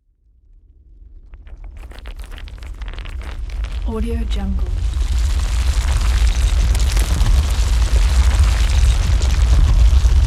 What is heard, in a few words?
earthquake; pure-noise